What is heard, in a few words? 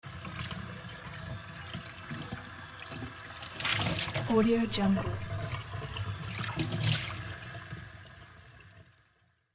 washing frying pan with water